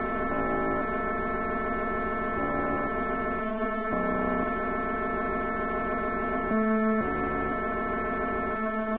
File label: tech ambience; source engine; hl1; video games